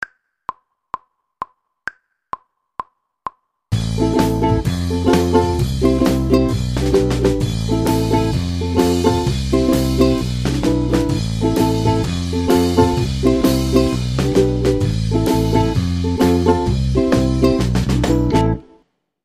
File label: ukulele